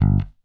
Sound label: bass stab